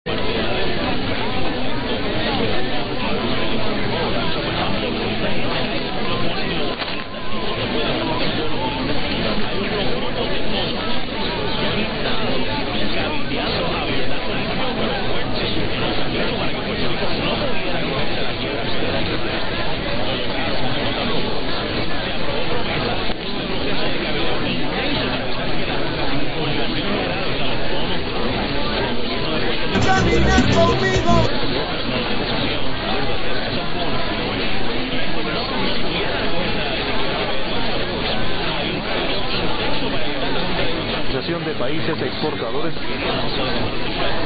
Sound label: Colombia parallel webstream; 580 HJHP R.Nacional de Colombia